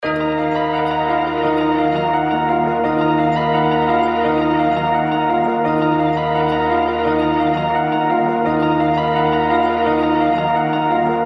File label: trap